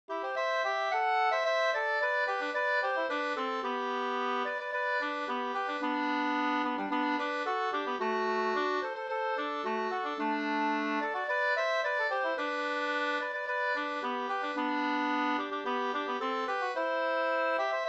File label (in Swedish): folkdans, dk; Fyrtur fr Hjorring 2st